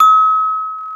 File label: BEL CELES, Roland; Roland - LA Composer vol. 1